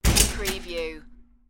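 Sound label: Oven Door Close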